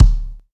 kick drum d key, kick drum sound tuned to the D note. Loudest frequency: 242Hz